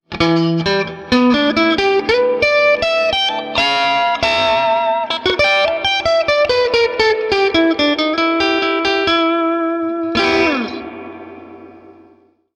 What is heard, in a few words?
tele, Swart AST